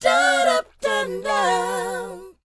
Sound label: DOWOP C#, Vocal R&B DOO WOP; ILIO; Vocal Planet